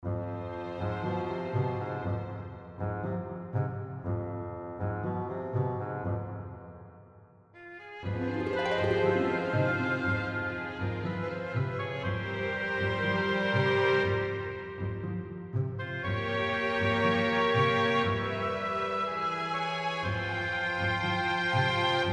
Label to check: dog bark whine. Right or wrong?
Wrong